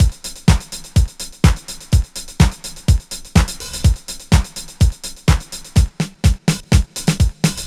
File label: disco drum loop; DRUMLOOPS; Best Service ProSamples vol.48 - Disco Fever; AKAI